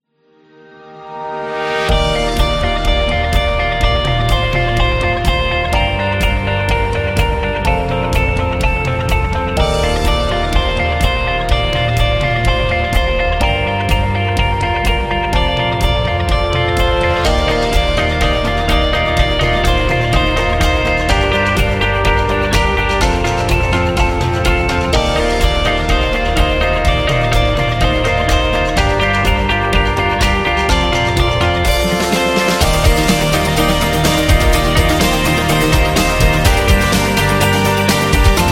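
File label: Indie Inspiring, Corporate background music; eco-friendly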